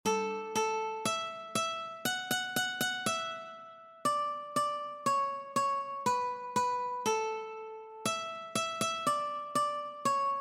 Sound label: Baa baa black sheep one string